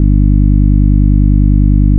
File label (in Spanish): ruido